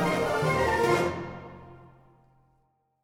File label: Music Generic Heroic 144bpm Mix Stop